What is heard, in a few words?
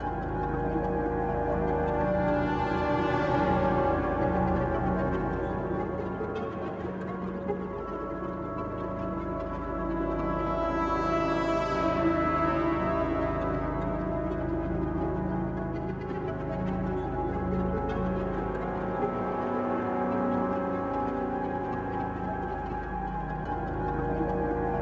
ui loading screen